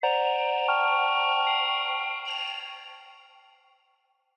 Nightmare Short Sting

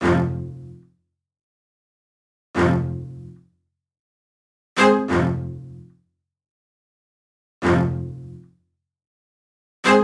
Hip Hop String Loop

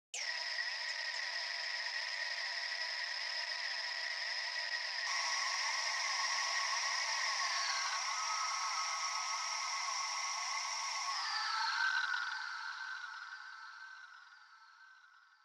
Noise Horror Effect Sound

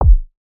Polivox Kick

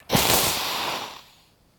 Serpent Spell Sound

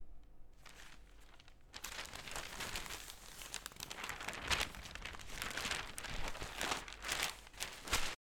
Newspaper Pages

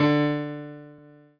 Piano Ff

